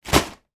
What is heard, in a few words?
pack of 2 toilet rolls put down on floor, household